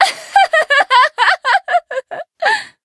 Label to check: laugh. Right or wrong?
Right